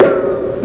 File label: THOCK; Spanish Net; CAFE